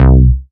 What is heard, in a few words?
Bass C, Offbeat Bass